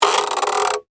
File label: Steampunk Lever, Scifi, Steampunk, Lever, Crank; Steampunk (Sound Effects:Science Fiction; BlastWave